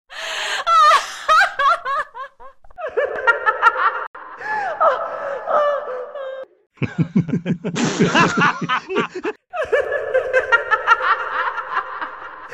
Crazy Laughing

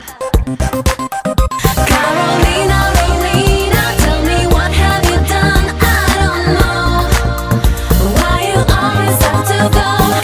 Ring

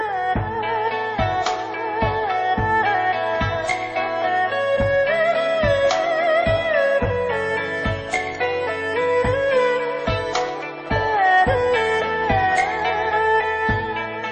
Flying Dance Song

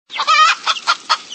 Chicken

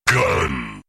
GUN; Break Gunner

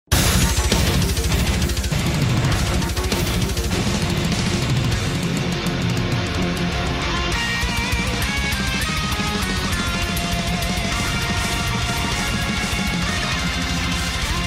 METAL; Rick and Morty

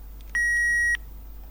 Siemens x45 Ton

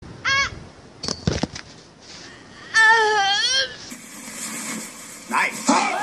Person falls over

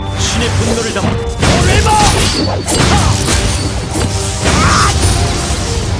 Hammer

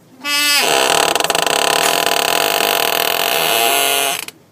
Squeaky Chair

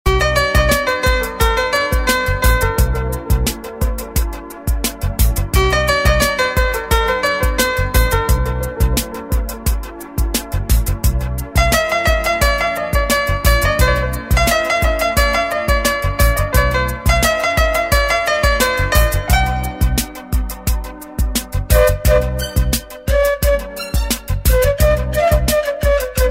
Telephone Dhun

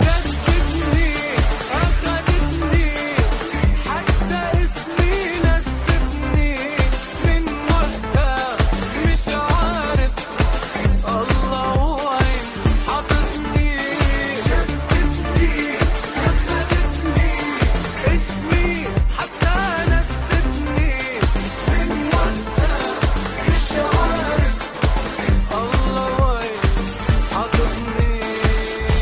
Arabic Love

